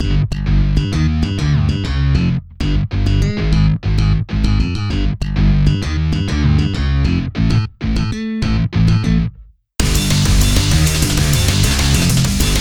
Thunderstorm (Instrumental); Friday Night Funkin' vs. Shaggy

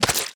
Zombie Smash

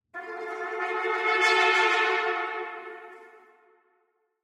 Stinger Light; Angry Birds Star Wars 2; Crimson

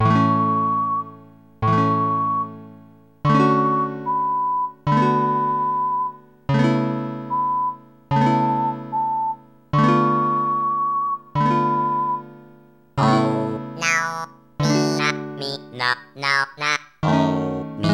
Chorale, Live; animal crossing let's go to the city

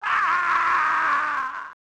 Man's Scream; Yakouchuu; SNES

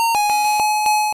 Icy Wind; sfx; attack moves; pokemon; gen 2; gsc

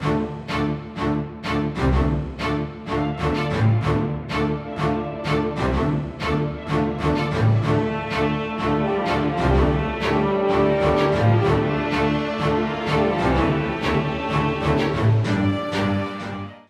Outro Music

